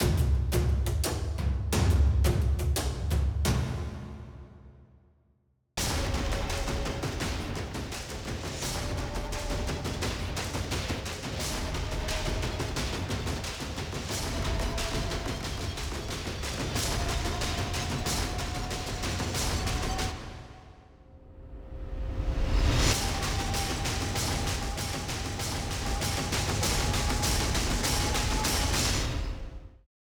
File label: MUSIC; JULY MOVIES; TV1